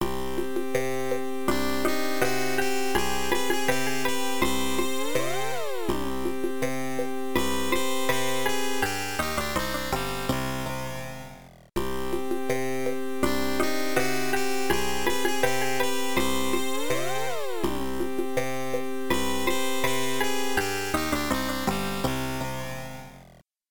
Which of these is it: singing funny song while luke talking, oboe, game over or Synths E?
game over